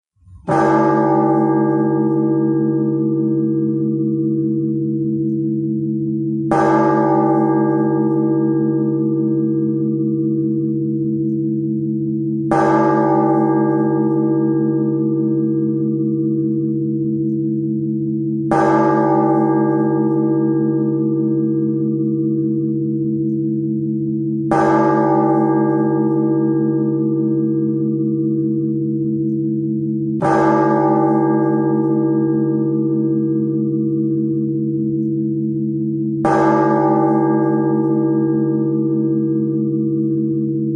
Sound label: Bells; Дзвони пам'яті